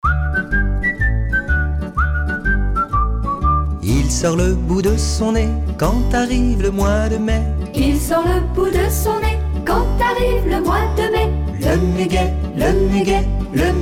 chansons enfantines

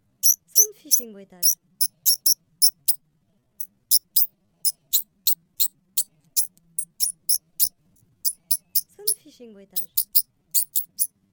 Bruitages de rongeur; Sound Fishing

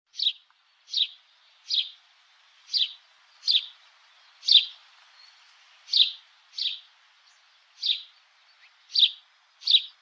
moineau

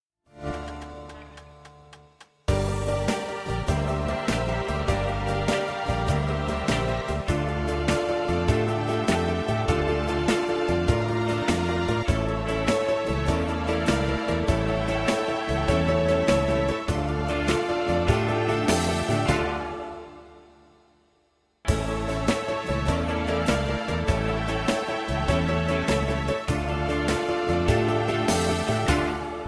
Karaoke Instrumental

